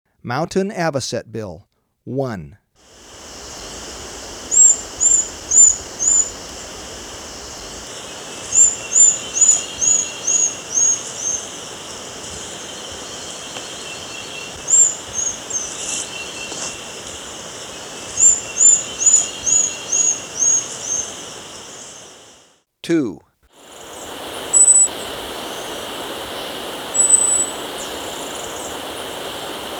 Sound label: Mountain Avocetbill; S. America